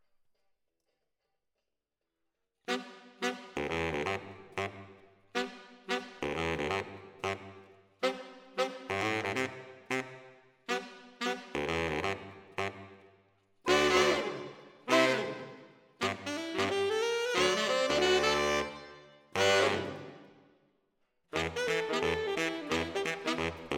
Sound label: ORTF SCHOEPS, piano